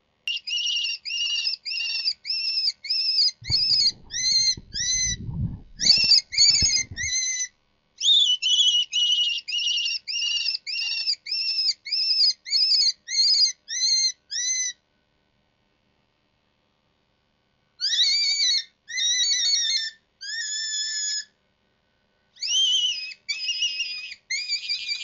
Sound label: Cottontail Distress; Rabbit; ORION